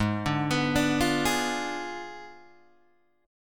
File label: G sharp Diminished 7th G sharp, Guitar Open G; 6,7,9,7,6,6